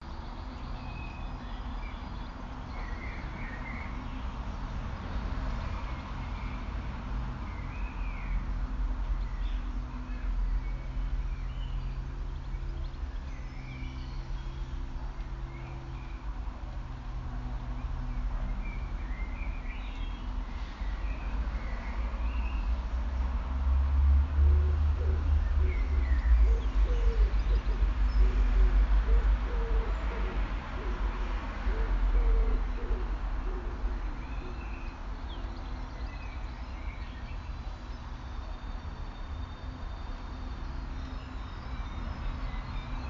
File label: Wind Chime Sound; Bird Sound Collectors